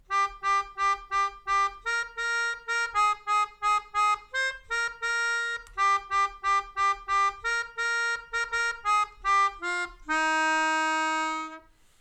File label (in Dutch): 3 maal 3 is 9, Melodica